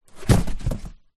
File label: sport exercise mat foam thin rolled up set down